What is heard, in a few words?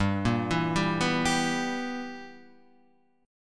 B Major 7th Suspended 4th Sharp 5th G